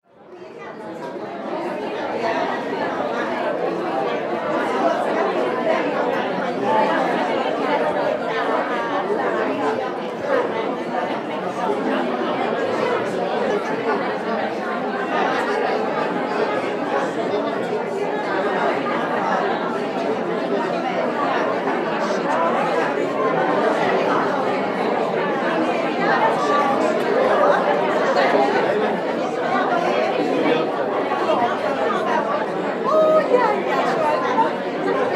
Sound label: Foyer Crowd; Walla